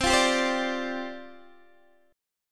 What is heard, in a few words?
C Suspended 2nd Suspended 4th, down, Guitar, DADGAD